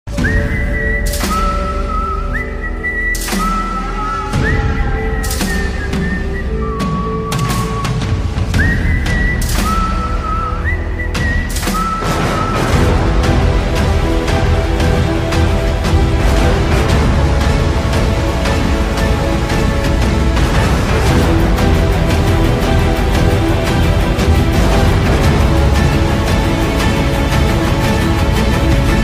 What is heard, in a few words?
tamil mass bgm; captain miller; priyankamohan; shivarajkumar